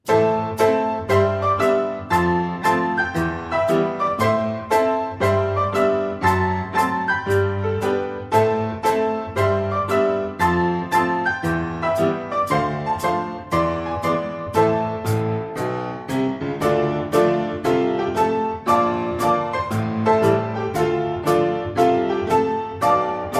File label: Piano Cover; Mr Bean